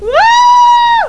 wooo; Games.Carmageddon

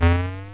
pad; Rick Dangerous

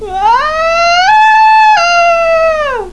woaaao; Games.Carmageddon

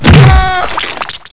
hit gibs

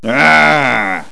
GRR; Games.Carmageddon

